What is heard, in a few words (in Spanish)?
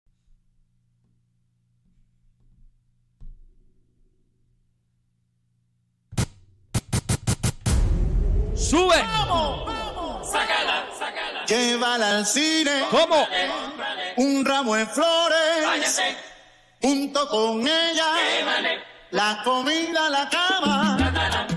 SALSOTECA EN VIVO